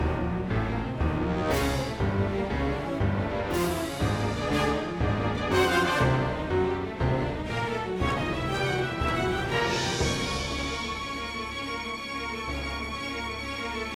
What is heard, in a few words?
Battle Music; Snd Bloom Battle Music